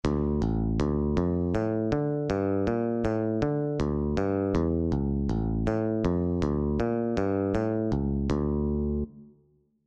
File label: Intervals arpeggiated bass guitar